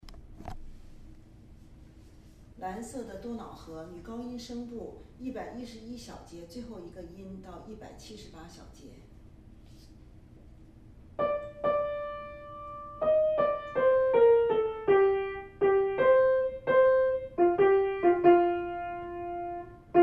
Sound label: bluedanube soprano